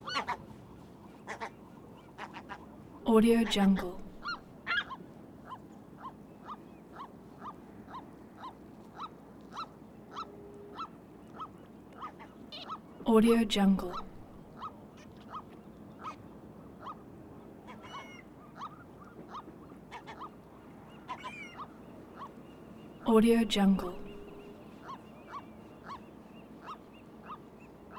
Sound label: bodega bay gulls calls screams squawk chatter